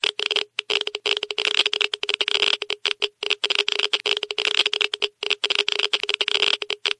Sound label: Geiger med; Items